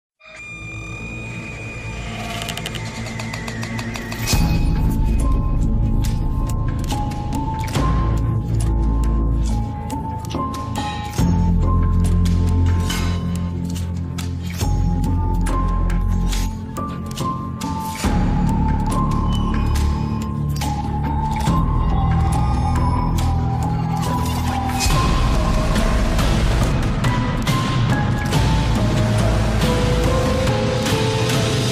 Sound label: Phantom Distortion; Five Nights at Freddy's; FNAF Security Breach Ruin DLC; Phantom Distortion (Trailer Music)